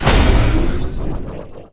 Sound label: twin cannon deactivate; gmod; lfs tx 130